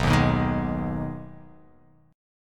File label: B 6th B down, chord